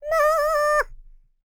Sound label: PERSIAN, World Voices; ILIO; Vocal Planet VOL-5